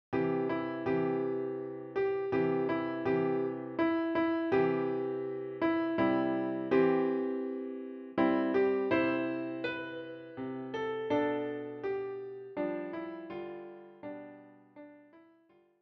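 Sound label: piano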